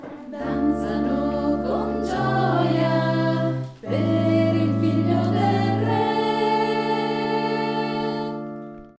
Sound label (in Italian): soprani